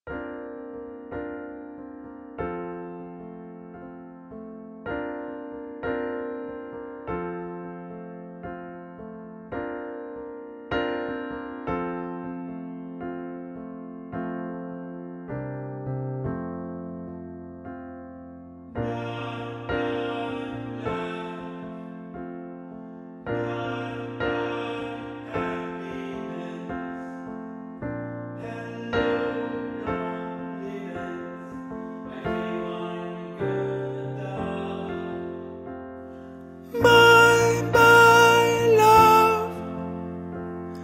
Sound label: SOPRANO